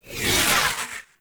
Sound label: Osprey Dodge; Warframe; Corpus